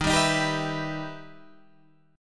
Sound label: E Minor Major 7th Eb